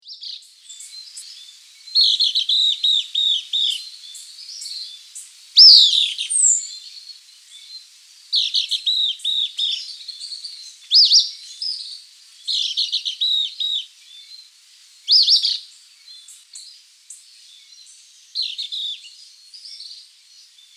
Elenie siffleuse Caribbean Elaenia, chants des oiseaux; Guadeloupe